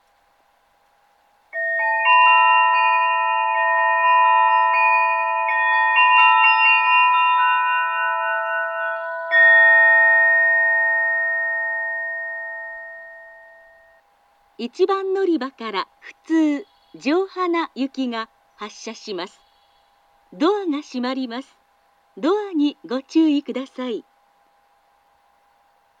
takaoka local johana, station

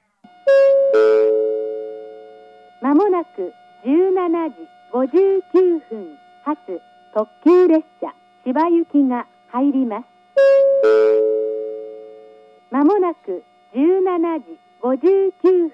limited express; jr-east; fujimi; chiba

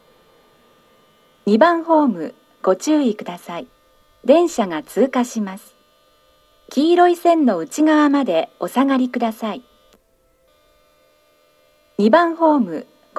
pass, station; koku-koen; seibu

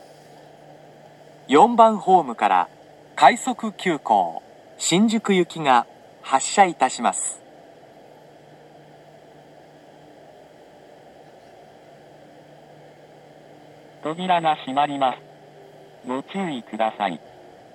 rapid express shinjuku; yoyogi-uehara; station

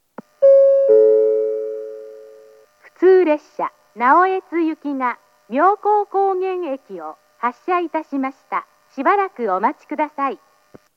arai local, station; jr-east; naoetsu

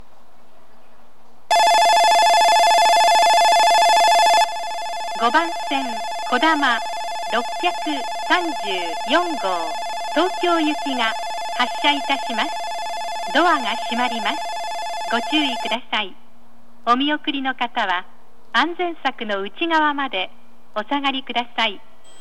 shizuoka kodama, station; jr central; tokyo

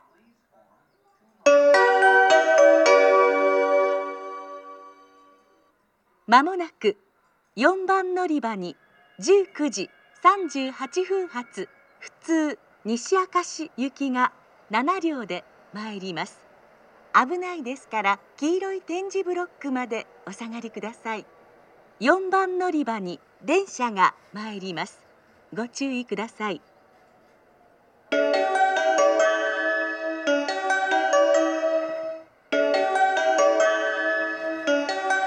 amagasaki local nishi akashi; station; jr-west

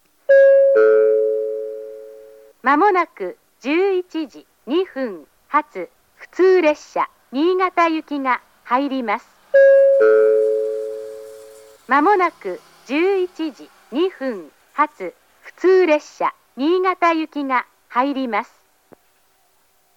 aoyama local, station; jr-east; niigata

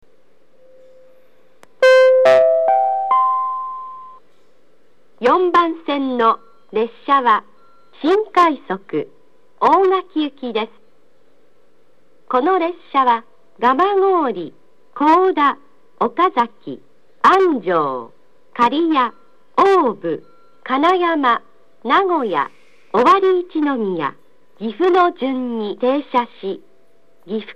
toyohashi new rapid ogaki; station; jr-central

